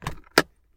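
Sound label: open car glove box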